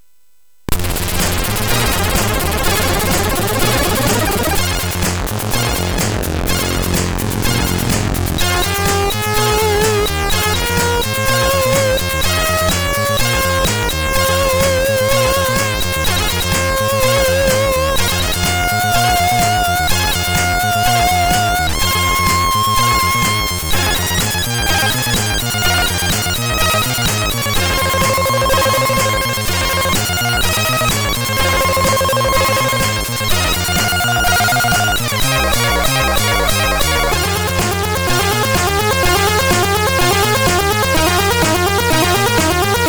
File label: Music Demo Version, MOS6581R3; hvsc